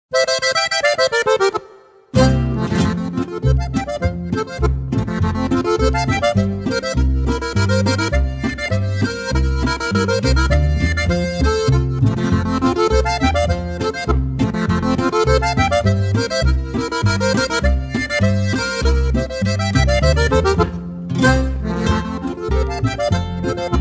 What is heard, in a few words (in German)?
Boarischer